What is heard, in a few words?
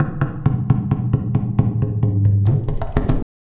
Tabla, 135 BPM; Zero-G; Pure Tabla